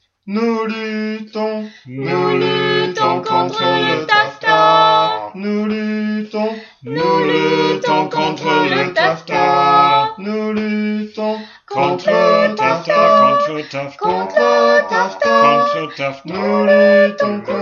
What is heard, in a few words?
Fight Tutti